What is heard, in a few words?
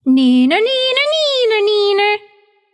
tongue light; smite; voicepack; hel